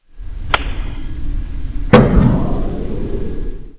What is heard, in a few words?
SEISMIC